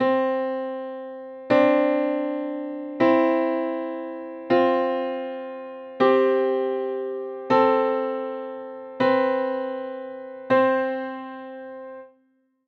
intervals diatonic

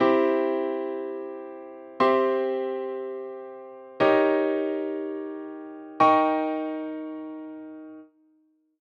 chords 3 notes positions; triades

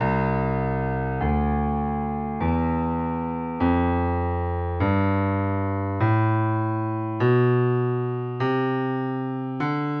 grand stave middle c; claves

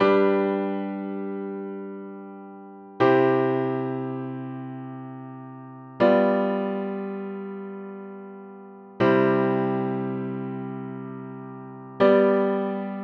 cadence authentic

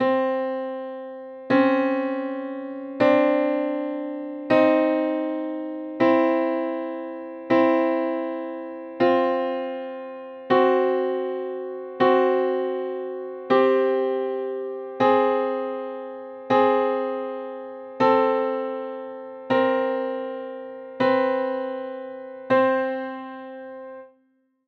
intervals chromatic

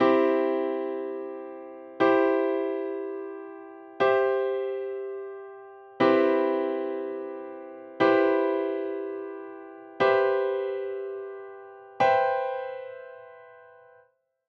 figured bass; baixo continuo

